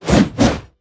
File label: BOSS Atk